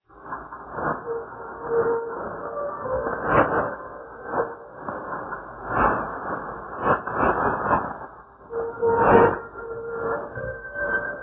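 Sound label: radio, 3987 kHz; RRI Manokwari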